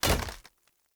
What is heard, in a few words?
Short Sword Medium Impact Wood Hollow Large